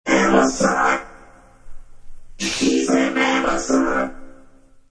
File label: original chorus acappella